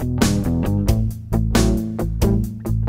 Blues in E accord de LA

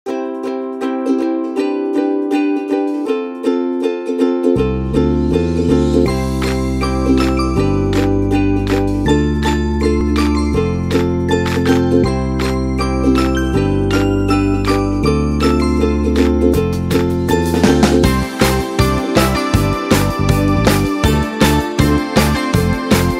Happy Kids Music; Pou Game Sky Hop

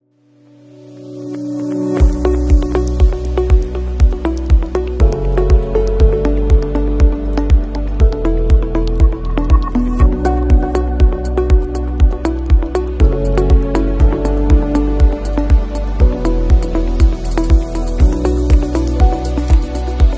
Minimal Tech

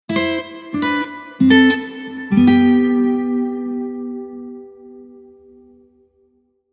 guitar

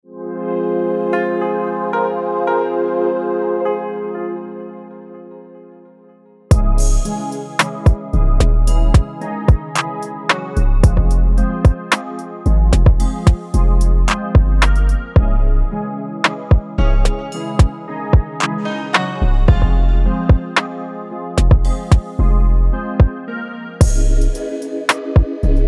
ghastly castle 111bpm loopable

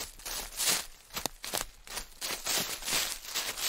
running on leaves sound effect

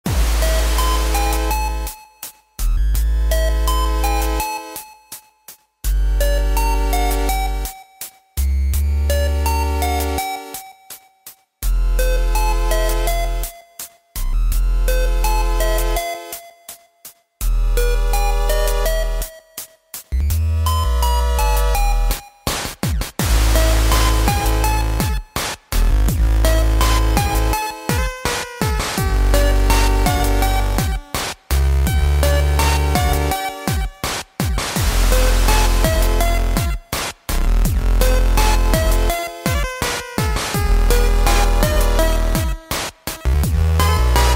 Freeplay Menu Music; FNF Hypnos Lullaby V2 OST

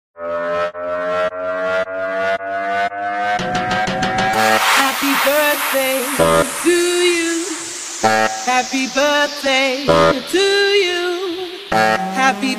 happy birthday original guaracha remix

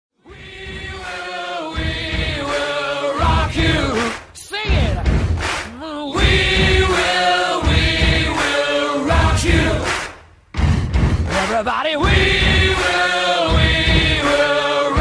Solo Backing Track Full Guitar Solo

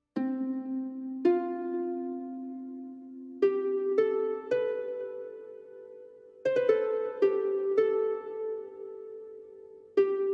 Windsong Lyre; Genshin Impact OST; 原神

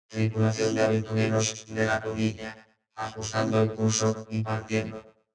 robot

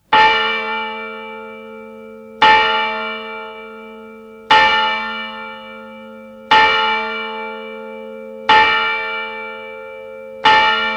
Church

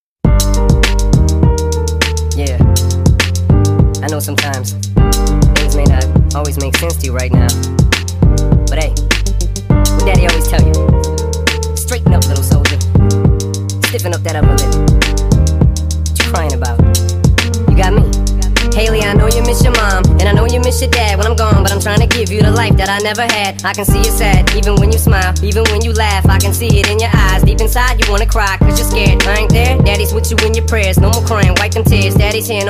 sped up

